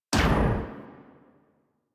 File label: Pulse Laser Mech; Railjack